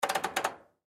Washer Dial Click, Washer Dial Clicks Washing Machine; Appliance; Household; Laundry; Blastwave